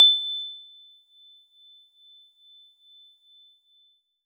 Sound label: Warble Celeste DX100 A-1